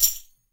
TAM, NYC Percussionworks; Sampleheads; 90 s Sample CDs

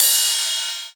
Rides, Cymbals

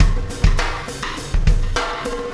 Wormhole Beat, Processed Drumkits

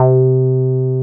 303 SQR C4 MF, SQUARE BS

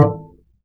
D STRING UPRIGHT BASS, Upright Acoustic Bass

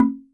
Log L, Yamaha DTXpress, Percussion; drum machine

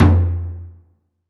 taiko F; Polyend; ARC Noise

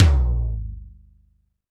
TD-20 Tom A, Roland TD-20 Toms

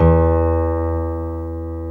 E1 PNO.FOR, G.PIANO; AKAI S-Series CD-ROM Sound Library VOL-7

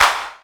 clap, House Oneshots; E Lab; XTc Files of House

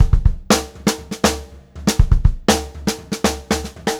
FUNKY, Funk Groove Drumloops; USB Soundscan vol.38; AKAI; 90 s Sample CDs; 120 FUNKY

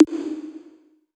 Electro Percussion, House; Drums

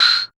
CR-78 Tamb, Tambourines; Percussion

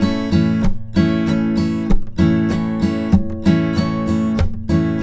RIFF, Blues Guitar Samples; ILIO; Fretworks

